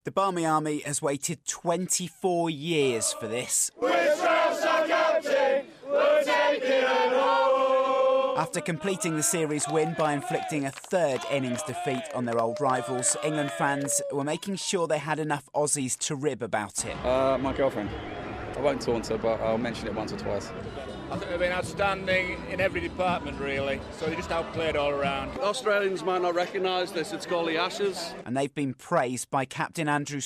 england cricket fans celebrate ashes victory down under